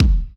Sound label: drum hit whistle